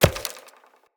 Hunter Bow Arrow Impact Vegetation; Weapon Impacts; Player; Bows; Environment; Envoy Hunter Bow

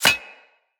Bullet Impact Armour; Weapons

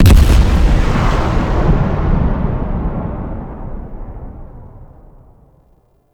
Obelisk Orbital Strike Launch; Railjack

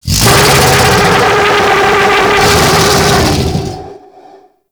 Vessel Close Scream; Warframe; Tau; Sentient Vessel

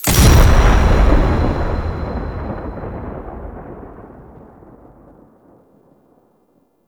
Select Menu Confirm; New Player Intro; Warframe

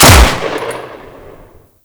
Shot, Lever Action Pistol; Warframe; Grineer

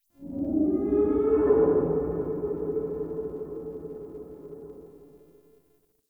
Exterior Distant Calls Scared; Duviri

